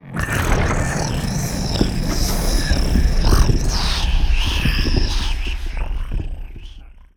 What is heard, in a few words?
lithoid portrait snake; lithoids; Stellaris